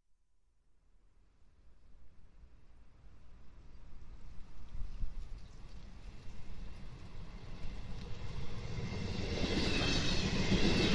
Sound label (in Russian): 3D Poezd; 3D звук , слушать только в наушниках (иначе эффекта ни будет ) , желательно в компьютерных